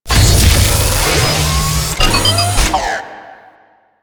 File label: Turret Chain Lightning Deploy; Warframe; Zariman